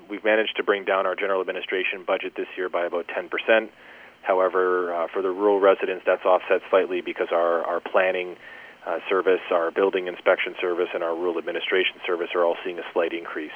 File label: Horn calls